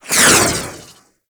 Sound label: Rifle Alt Projectile By, Weapons